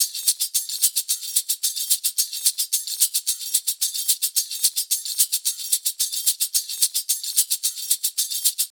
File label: tambourine loop, 110; perc construction loops; SEISMIC Drum Sample Pack; Slate